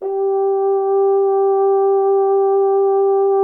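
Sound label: French horn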